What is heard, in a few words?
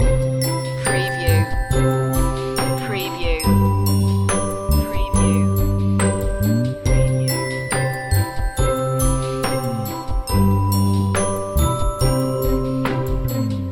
lullaby beat loop